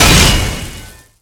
impact generic

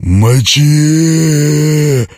zombie attack

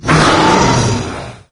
attack solo, wraith; doom3

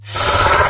attack, imp; doom3

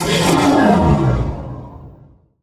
injured

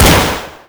semi fire

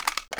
p90 insertmag, weapons

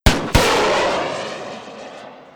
rpg fire far

